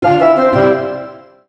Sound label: STAR END